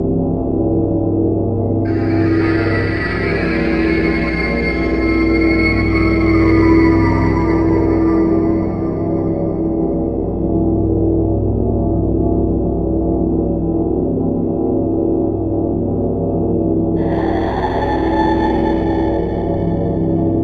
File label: Drone Evil Loop; spookware